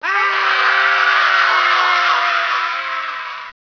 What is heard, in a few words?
scream, scientist